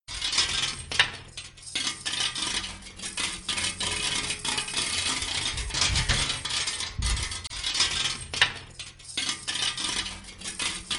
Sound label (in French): Cliquetis chignolle